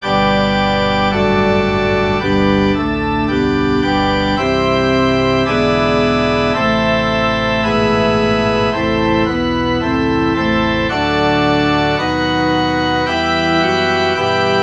forspil; ddk 216 hil dig, frelser og forsoner

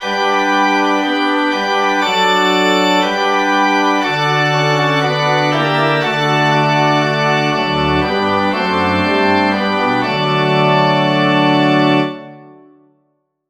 forspil

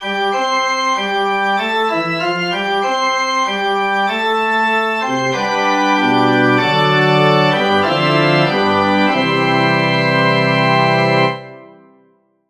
forspil

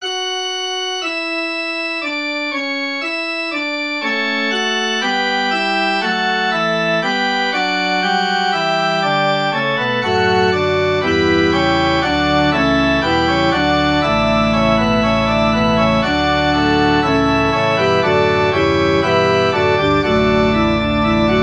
forspil; ddk 193 hellignd de frommes glde

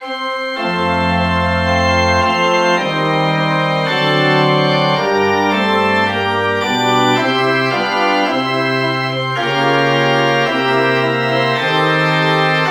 forspil

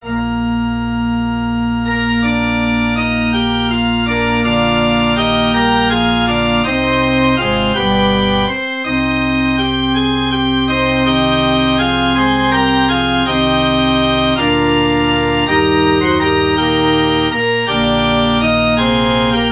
forspil; det er s yndigt at flges ad

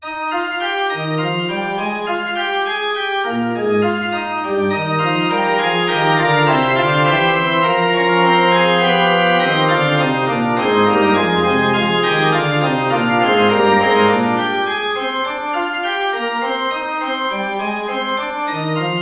forspil

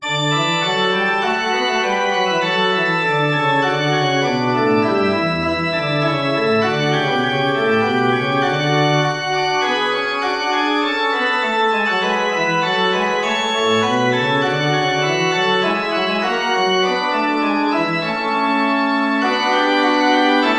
forspil

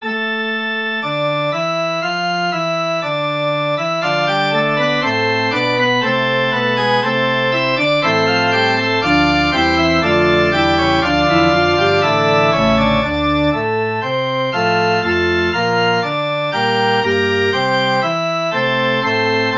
forspil; jeg raaber fast

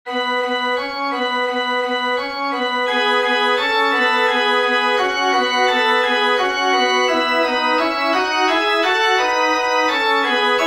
forspil

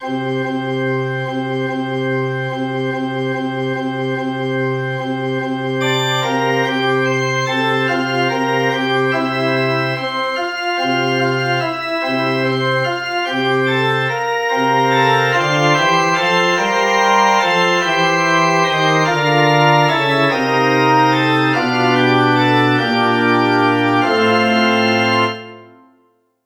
forspil

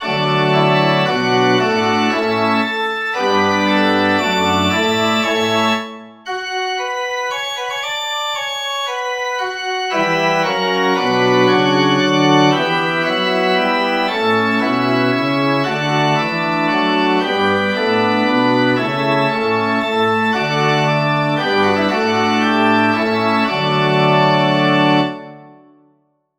forspil

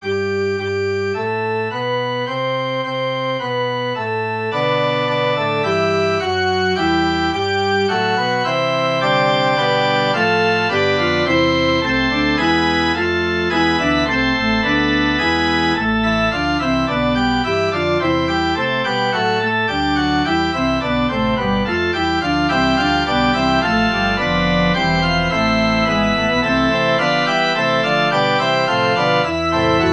forspil; midt i livet er vi stedt

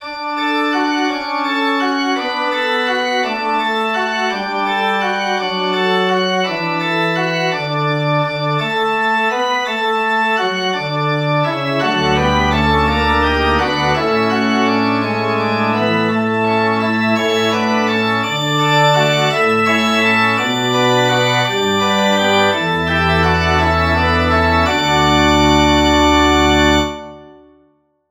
forspil

